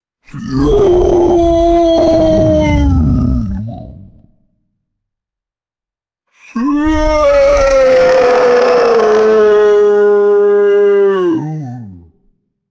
guttural humanoid creature wail of pain; creatures

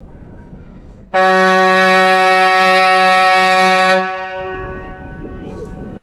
single long marine horn blast; boats

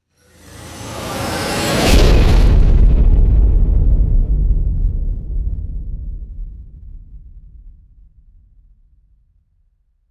cinematic metallic riser and heavy impact; designed

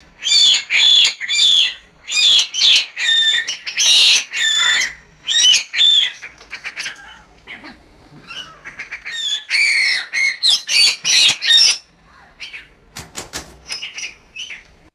agitated birds screeching and flapping in cages

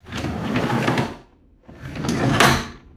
drawer opening and closing with a rattle; drawers

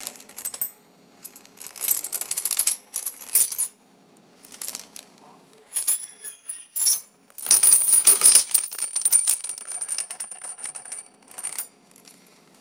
small metal chains handled and dropped

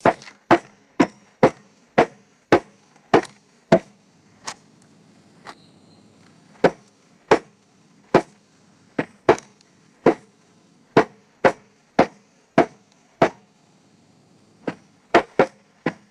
heavy wooden poles pounding soft dirt

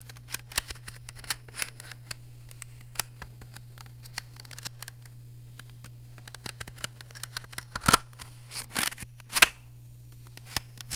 handling a plastic vhs tape; foley

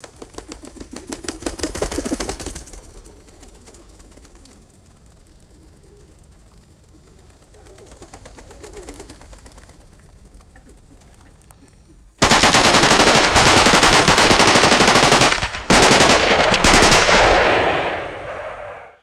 fluttering pigeon wings and echoing rifle shots

